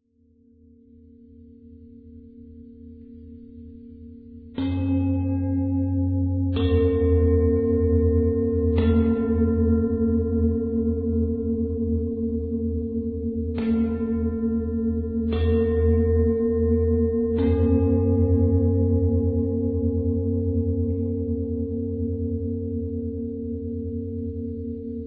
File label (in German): Klangpfad